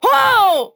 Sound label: Falling; paladins; Furia